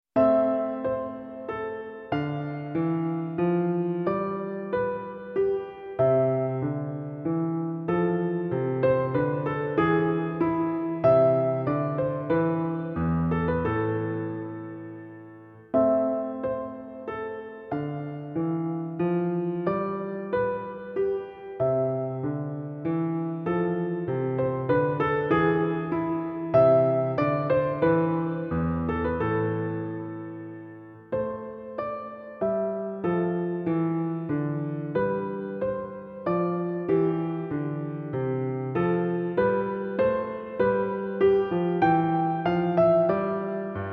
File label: Krieger Menuet Piano